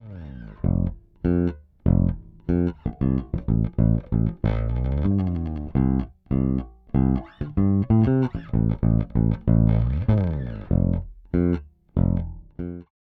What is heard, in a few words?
Zoom B2 Four Solid Preamp